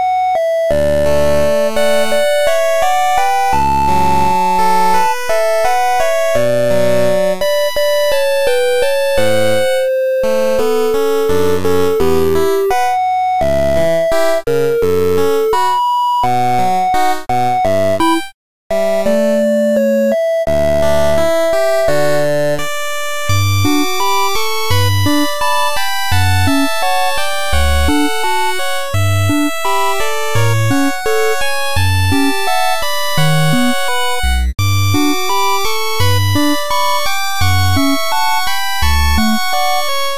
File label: 8bit odayaka ienami Loop